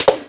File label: stomp; goomba koopa paratroopa; ssbm